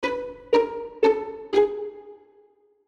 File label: String Plucks, String Plucks Descend; Cartoon; Musical